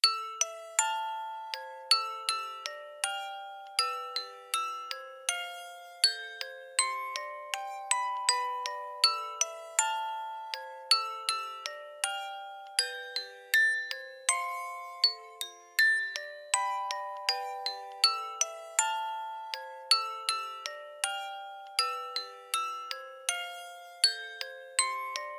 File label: Blue Bird Lamentation; Zero Escape The Nonary Games; ps vita ps4 windows xbox one gamerip 2017